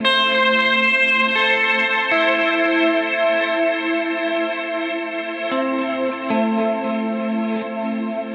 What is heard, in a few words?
115 D Gtr Clear Skies; Zero-G; Future Wave